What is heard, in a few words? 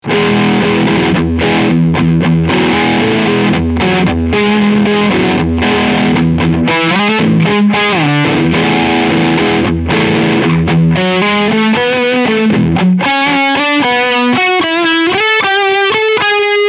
Fuzzy